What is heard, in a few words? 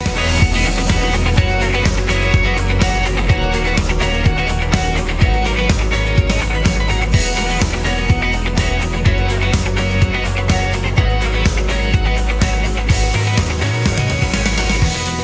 loop